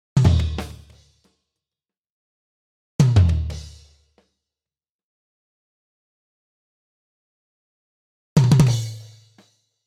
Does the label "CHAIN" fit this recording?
No